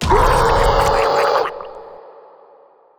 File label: scream gurgle hi